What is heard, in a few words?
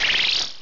Grass Pledge; battle anims; PokeRogue